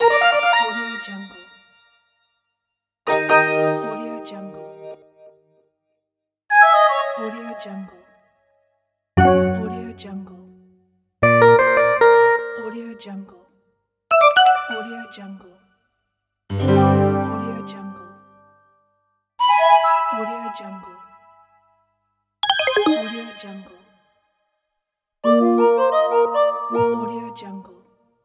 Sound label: user interface games sound pack